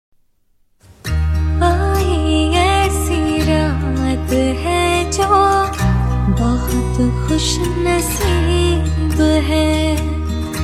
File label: Female Version